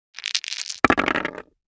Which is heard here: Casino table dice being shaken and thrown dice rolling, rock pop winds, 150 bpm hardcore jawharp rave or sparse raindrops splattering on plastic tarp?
Casino table dice being shaken and thrown dice rolling